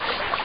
teleport; scourge

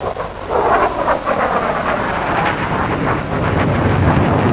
jet

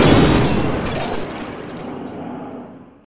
crash; gltron-0.70

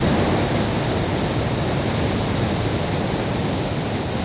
jetpack; weapon; wormux

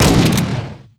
shotgun micro; weapons; ufoai

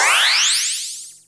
freeze; njam

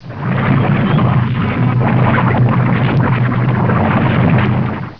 lava flow, water; liquidwar

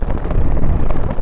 move ground tank

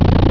digger; pingus

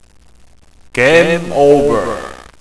gameover; lianliankan

